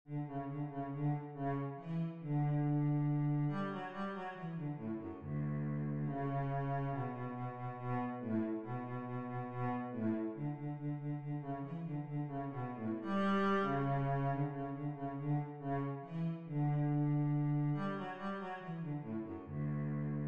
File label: cello; nutcracker